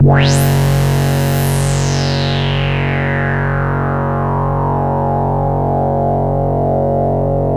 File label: DRONE F#1; Best Service Alpha Dance vol.2